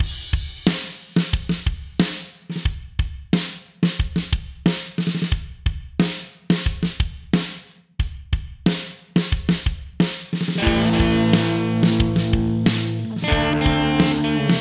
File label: Indie Rock Groove